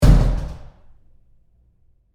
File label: Door Bathroom Stall Close Roomy Hard; Big Room Sound